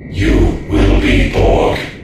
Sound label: alert; borg cube